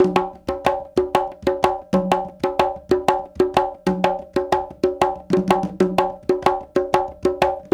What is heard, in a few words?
Bembe 6/8, World Dance